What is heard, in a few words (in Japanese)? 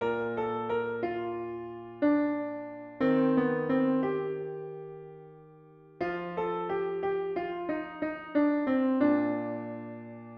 ピアノ